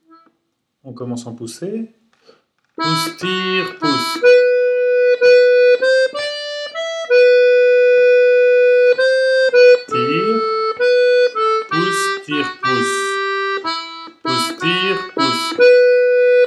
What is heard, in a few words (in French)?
Mazurka des écoliers MD, accordeon